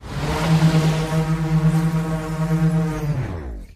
Engine Ringtone

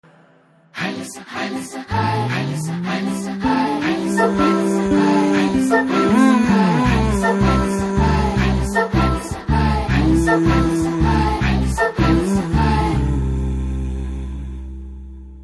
BGM Humming Ringtone; Uppena

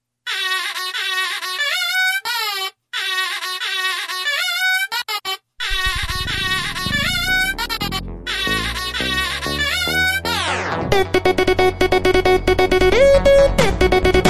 Telugu BGM Ringtone

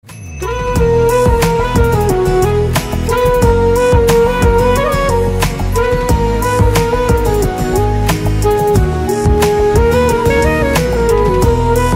Instrumental Ringtone

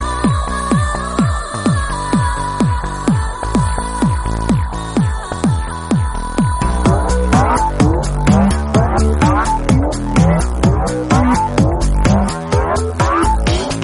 Drum Beats Ringtone

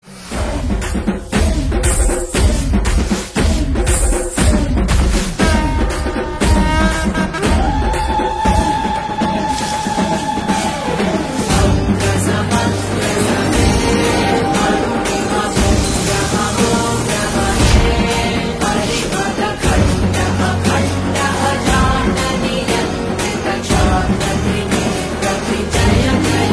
Drum Beats; DSP; Yellamma Glimpse

